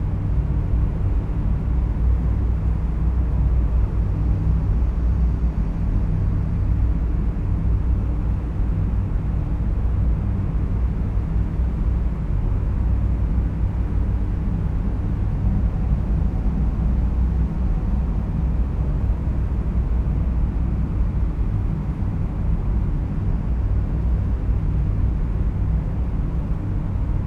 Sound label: amb system desolation space; Stellaris; nemesis